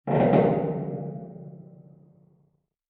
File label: metal creak; Dystopia; injection